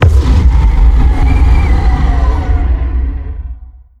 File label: dimensional horror laser attack; Stellaris; guardians